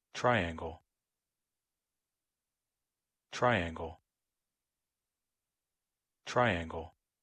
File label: TRIANGLE